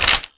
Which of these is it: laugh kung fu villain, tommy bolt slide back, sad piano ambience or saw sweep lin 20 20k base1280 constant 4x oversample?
tommy bolt slide back